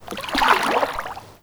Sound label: Water Lapping Gentle Small; Ambience; Warframe